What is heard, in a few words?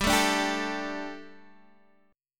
G Suspended 2nd, Guitar DADGAD; chordshape; down